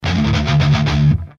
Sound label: Overdriven Guitar